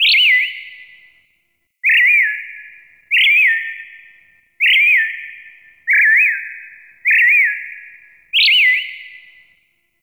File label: Terrarium Bird Chirp G; Warframe; Ambience; Entrati; IntTerrarium